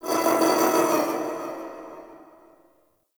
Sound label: Sentient Lair Exterior Creature